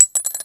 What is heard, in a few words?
ammo casings